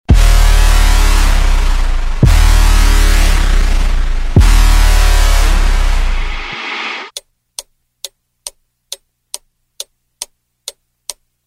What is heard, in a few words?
Dance Music